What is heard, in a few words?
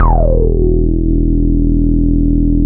psychadelic, acid, bass analog & synth; USB Soundscan vol.12; AKAI